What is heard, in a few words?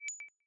button hover